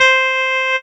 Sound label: Casio CTK-611 Shanai C5